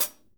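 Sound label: hi hat; Best Service Gigapack 2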